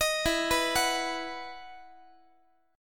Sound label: E Major 7th Suspended 2nd, Guitar; EM7sus2 arpeggiated